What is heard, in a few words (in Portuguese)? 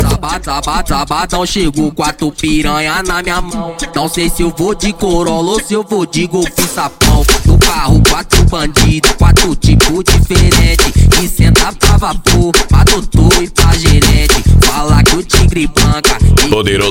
funk sertanejo